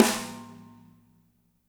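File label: snare left; Spectrasonics; Burning Grooves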